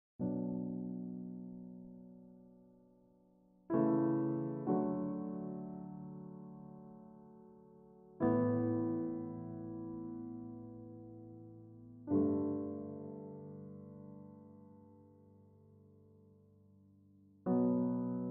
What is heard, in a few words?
Piano Instrumental